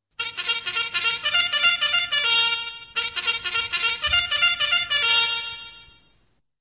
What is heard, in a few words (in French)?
Clairon, Un clairon